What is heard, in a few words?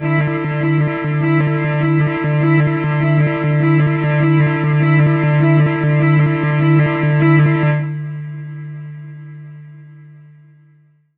Synth Swarms D; Trance Formation